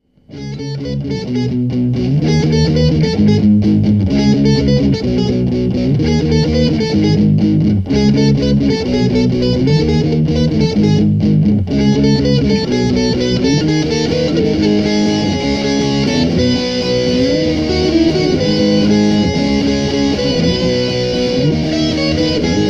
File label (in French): Improvisation a la guitare